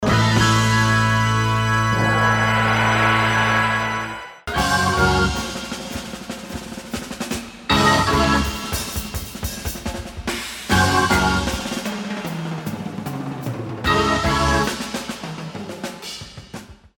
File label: Fanfare Comparison